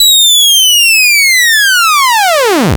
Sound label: C64 Falling Object; 8-Bit; Chiptune; Commodore 64; FX